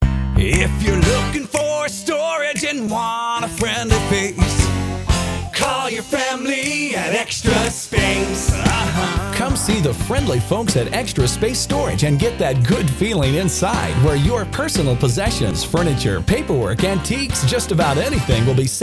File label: JINGLE vo, storage jingles; Extra Space